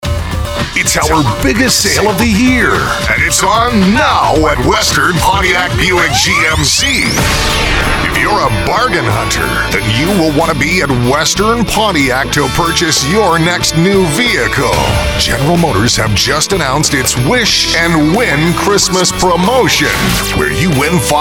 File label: Xmas Music; Western Pontiac Wish And Win